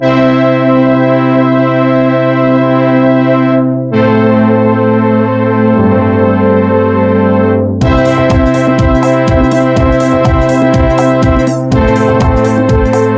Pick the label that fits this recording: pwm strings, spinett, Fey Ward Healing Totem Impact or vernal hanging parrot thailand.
pwm strings